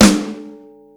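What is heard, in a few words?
snare rock flam, Yamaha Motif ES drum machine; 300 Drum Machines; Yamaha Motif ES-Series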